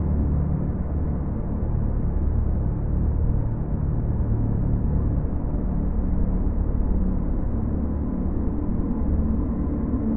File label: Diving Music Main Pad